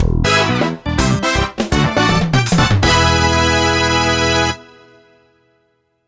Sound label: Grand Prix - 2nd-6th Place (Fanfare Only); mario kart wii